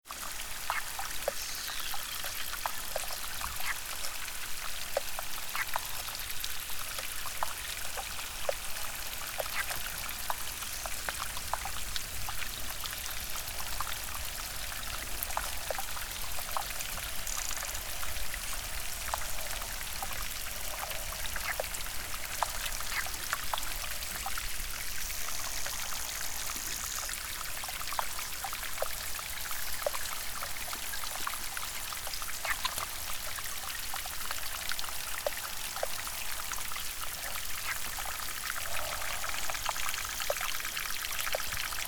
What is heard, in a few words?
Hidden Lake Underwater